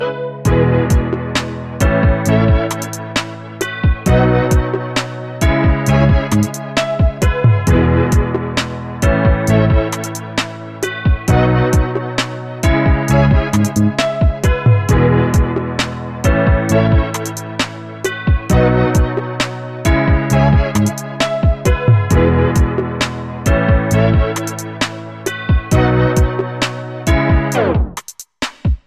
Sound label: multi voice chorus; e chorusfx700